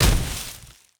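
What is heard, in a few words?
Vine Platform Land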